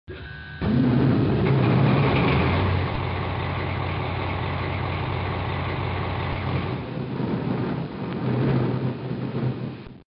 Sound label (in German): diesel kurz